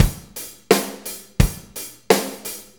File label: 4-4 Loop, Drum Loops, Full Kit Loops; Drum Tools; Sonic Foundry (Sony Creative Software); Loops & Samples Drum Tools